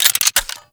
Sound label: Double Barrel Shotgun Chamber Open; Warframe